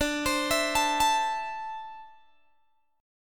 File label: D 7th Suspended 2nd down Guitar Open G